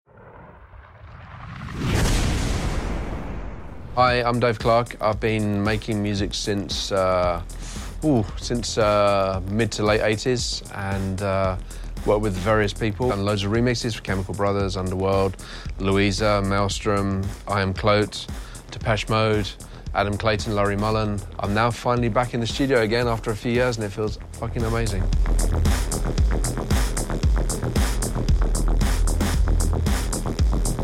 waves

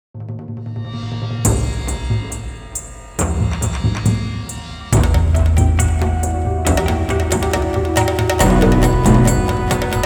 Jungle Assault

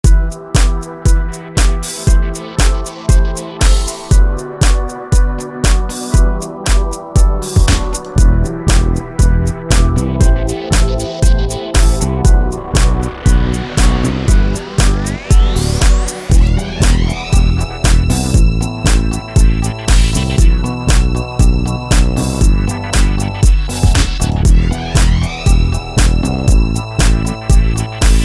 dance chill

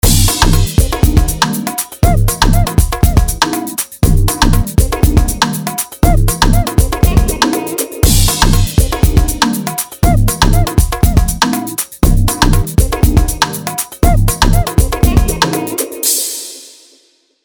far out

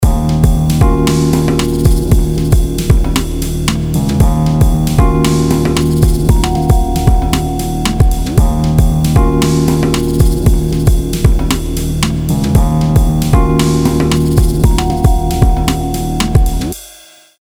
mystery

